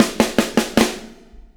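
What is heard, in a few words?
REVEREND FILL right, drums; Spectrasonics; Backbeat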